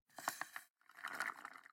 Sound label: Juice Drink; MiSide